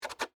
Door Hinge Pin Out, Foley, Door, Hinge, Pin, Remove